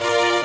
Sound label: RAP STRING; Strings